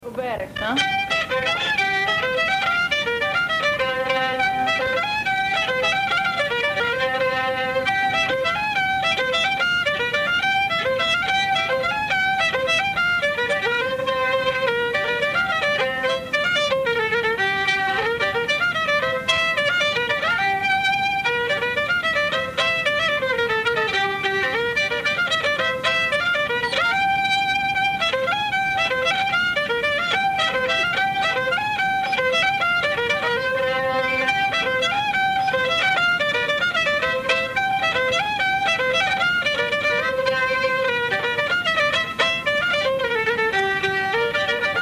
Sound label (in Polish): oberek